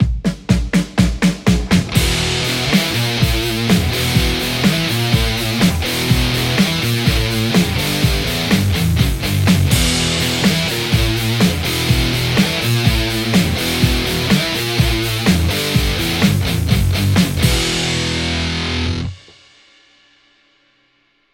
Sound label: Les Paul